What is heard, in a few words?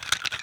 quiver; minetest; x bows